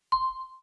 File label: chimes (C)